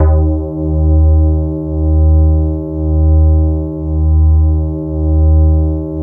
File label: E HARP R, DX HARP